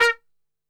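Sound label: A#2 trumpet shot, Brass Super Section; Best Service; TRP SHOT; 90 s Sample CDs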